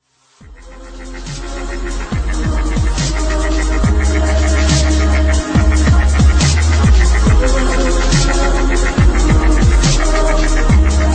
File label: dnb ringtone